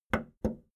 video tape player plastic play click slow

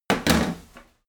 wood drop

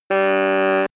horn long

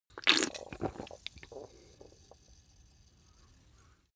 drink soda can small slurp

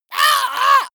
monster painful cry high

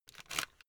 headphone retract unsteady quick

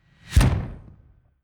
incoming kick drum

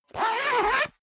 zipper down jacket close fast

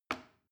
plastic button small release heavy

